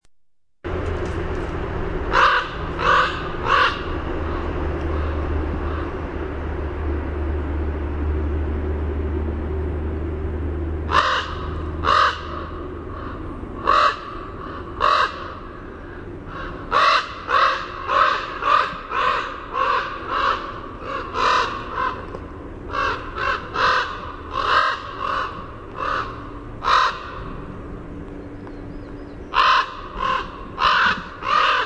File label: CORA call